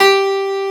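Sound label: PIANO ROOM G3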